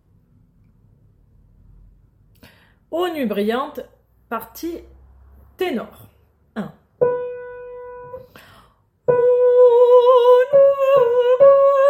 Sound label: Tenor